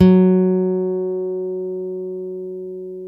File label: STEEL, Guitars